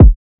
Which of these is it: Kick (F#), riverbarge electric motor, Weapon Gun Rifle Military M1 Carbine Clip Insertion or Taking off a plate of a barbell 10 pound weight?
Kick (F#)